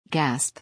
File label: gasp